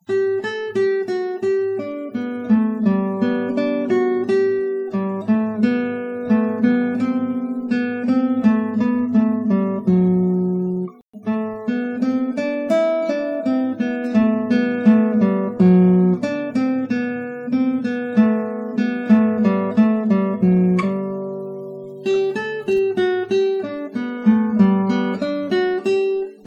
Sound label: classic guitar